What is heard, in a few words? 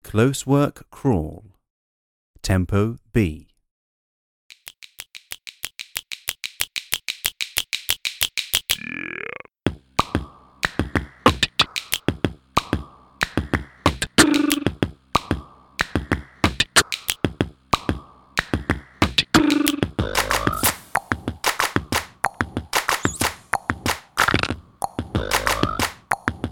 Closework crawl tempo B, Tap; Ballet